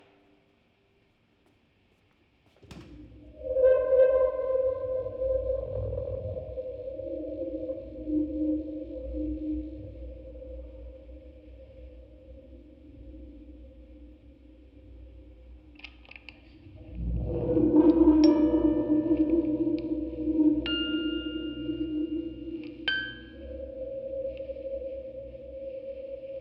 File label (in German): Aufnahme Rode NT4, Spieluhren-Quartett mit Tonband, Vocoder und Fieldrecording